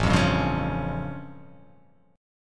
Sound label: A Augmented 7th A; 5,8,5,6,5,8,x,x